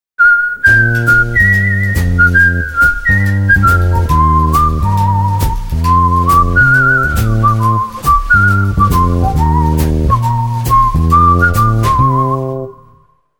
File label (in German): Jingle; Lotto Hessen